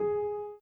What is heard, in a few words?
Piano Chord